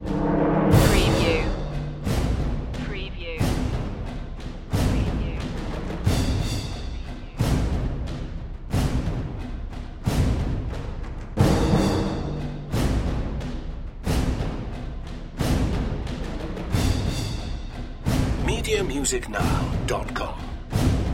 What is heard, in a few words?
perc mix